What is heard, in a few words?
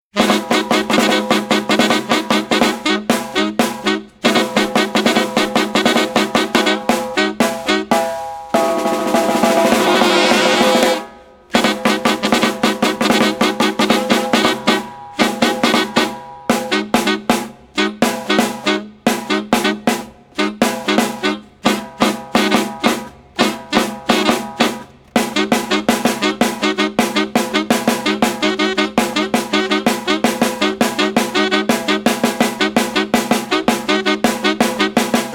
Sax Snare; Sax Ruins 2009 Yawiquo